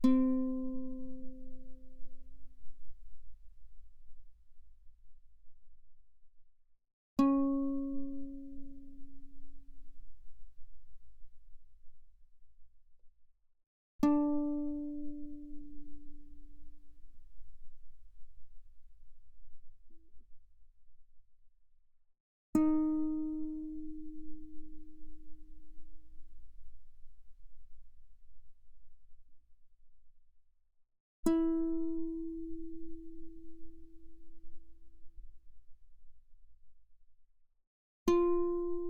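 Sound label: Guitar mezzo forte sul G C4 B4; mono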